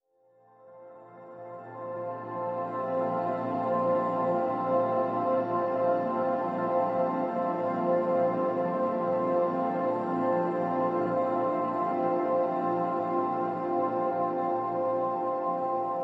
130 PAD LAYER Fmaj

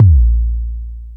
JD800 Dance Card Drums

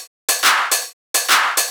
Shuffled Loops, 140 BPM

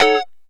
Lounge Guitar Chick 9ths B3; Lounge Guitar Chicks

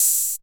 OH; Drums; ZERO G - Datafile DISC 1

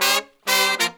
Phantom Horns, 132BPM; 90 s Sample CDs; Zero-G

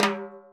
Timbali Flam Hi; Sonic Foundry (Sony Creative Software); Joe Vitale Latin Percussion

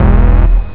Res Bass; jazz jackrabbit 2; M8

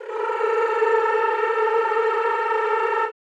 CHO Rrrr A3, Roland; Sound & Vision; Gigapack I CD 2; CHO Mmhh + Rrrr

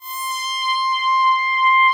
CY MELODY C5; Best Service Dream Experience

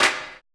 FOOT CLAP; Drums In Your FACE!; CLAPS

